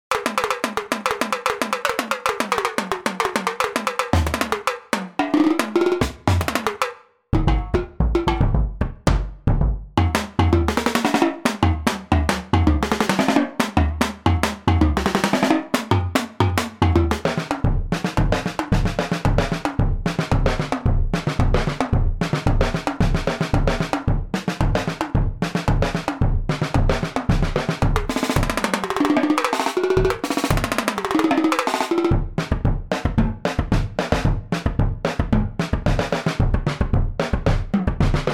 World Percussion

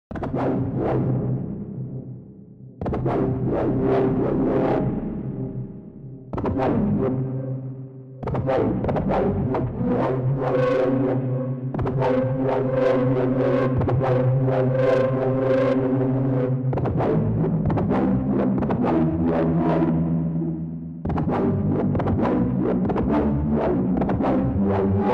noisy

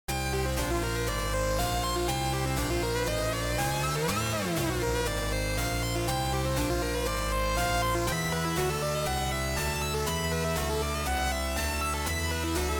Analog Lab

